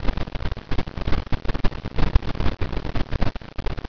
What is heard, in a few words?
magnet, crackling; SimScience